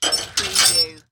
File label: Chain Metal Clanging